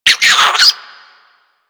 Infested Minion Bark, Vocals; Warframe

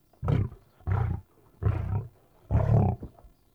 Wolf Growl Run; Animals

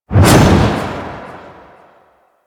Hover Attack; Enemies; Deco Hover Attack Base; Warframe; Warframes; Pax Duviricus

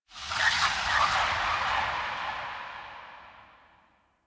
interior cave texture whispers; Ambience; Misc Indoor; DE; Warframe; Infested Eris

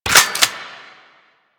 Reload; Gotva Prime; Warframe; weapons

